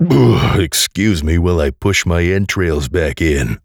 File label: Pain; Duke Nukem; Forever 2001